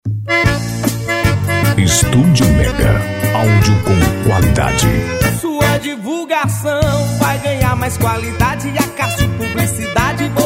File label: JINGLE; ACÁCIO PUBLICIDADE